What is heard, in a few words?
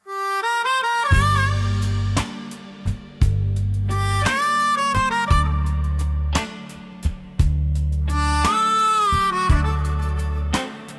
ukrainian beat